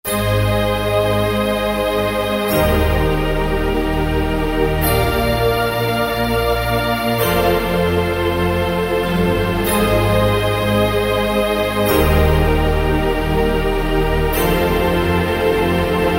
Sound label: HEAVEN STACK, Kurzweil K2000; Kurzweil K2000 Contemporary ROM; Contemporary ROM Programs and Demos diskette